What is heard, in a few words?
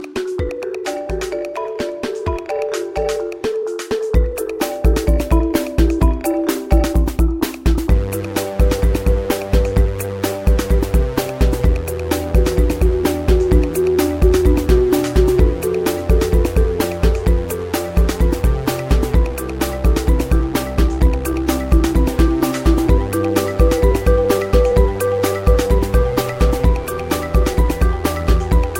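Relaxing Tropical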